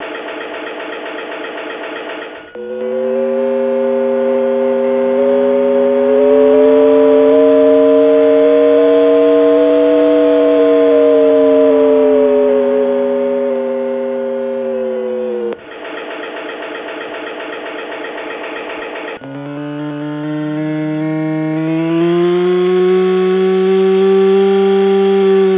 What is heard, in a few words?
electric motors